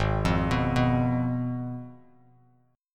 G sharp Minor 6th G sharp down Bass Standard; G#m6 arpeggiated; JGuitar

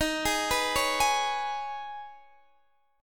Eb 7th Suspended 4th Sharp 5th, Guitar, DADGAD

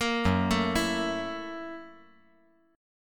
F sharp 7th Sharp 9th; arpeggiated; JGuitar

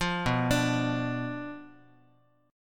B Diminished B down Guitar; Bdim arpeggiated

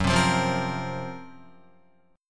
Gb Major 7th Flat 5th Guitar; GbM7b5 strummed; JGuitar

